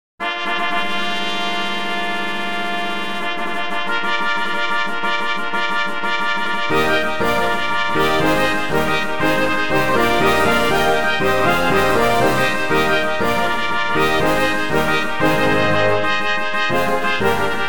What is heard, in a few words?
Fanfare; Rachmaninov